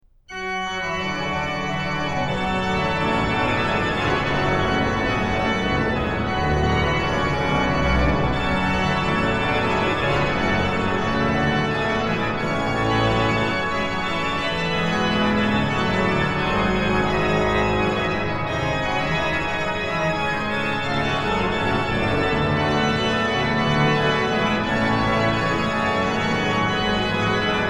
Wir Christenleut, organ; BWV0612; BACH; BACH ORGAN WORKS; CHORALE PRELUDES

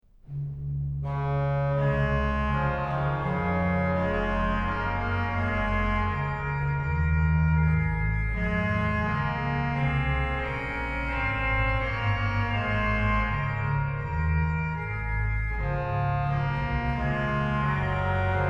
organ; Wie schon leuchtet der Morgenstern; BACH; BACH ORGAN WORKS; CHORALE PRELUDES

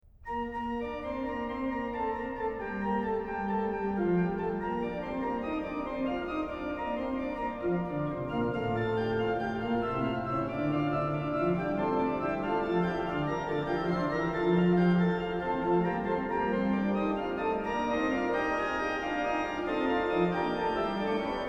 O Herre Gott, dein gottlich Wort, organ; BACH; BACH ORGAN WORKS; Chorale Preludes

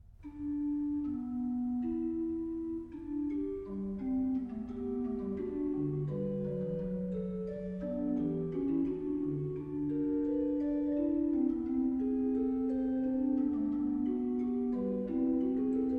Fugue in G major, ORGAN; BWV581

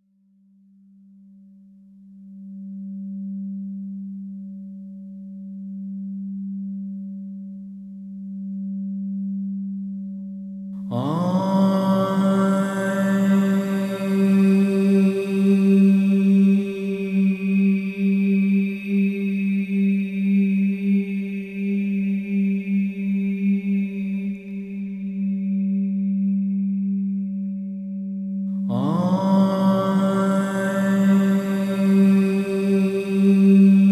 overtonal singing; Yoga and sound

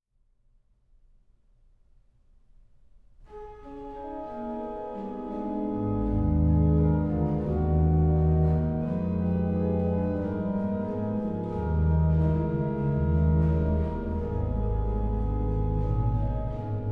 ORGAN, Chorale Preludes; BWV0599; Nun komm, der Heiden Heiland; BACH; BACH ORGAN WORKS

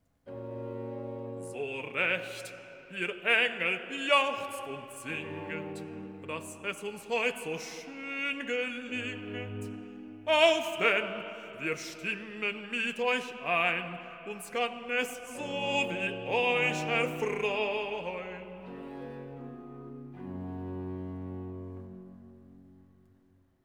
Recitativo (Basso); So recht, ihr Engel, jauchzet und singet; BACH; Christmas Oratorio

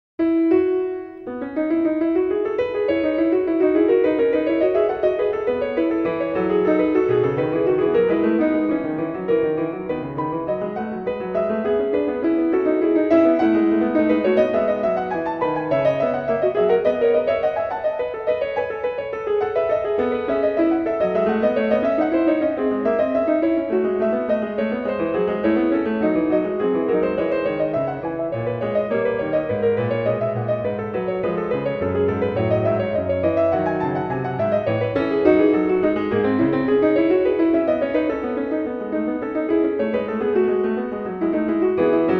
Fugue in E Major; BWV 854; BACH; WTC I

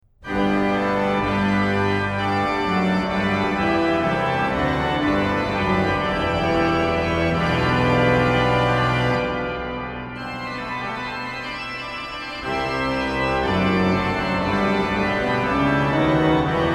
Herr Jesu Christ, dich zu uns wend, organ; BWV0726; BACH; BACH ORGAN WORKS; CHORALE PRELUDES

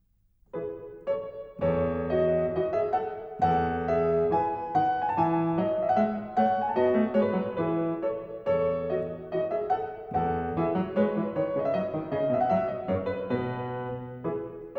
Gavotte, KLAVIER; French Suite No 6 In E Major; BACH

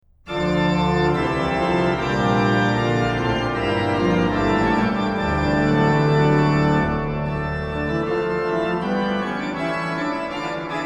Jesu, meine Freude, organ; BACH; BACH ORGAN WORKS; Chorale Preludes

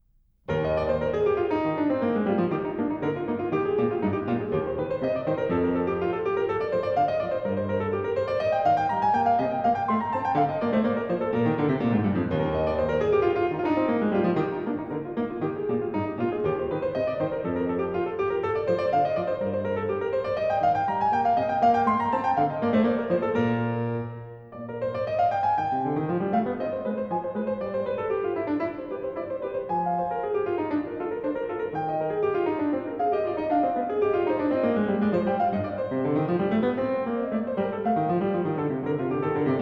Air, KLAVIER; French Suite #4 In E Flat; BWV 815; BACH

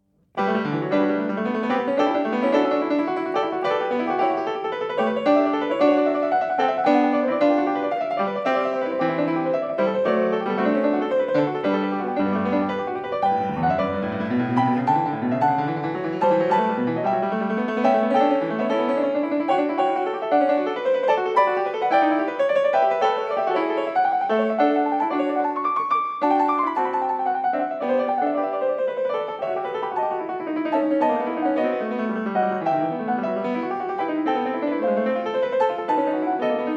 Bach Goldberg Variations a 2 Clav, PIANISTS

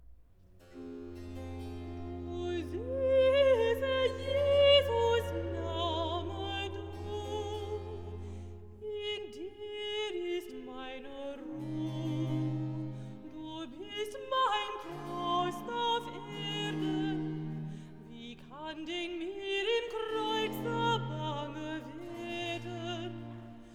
Recitativo Alto, BACH for ALTO; Gott wie dein Name so ist auch dein Ruhm

